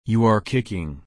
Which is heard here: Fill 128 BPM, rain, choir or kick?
kick